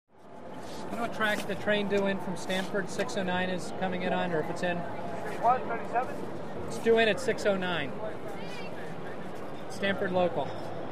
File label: Train Station, Variety Of People Asking Questions About Train Schedules, Info Man Through Speaker Has New York. Accent. Distant Walla Roar, P.a. Announcer At Tail.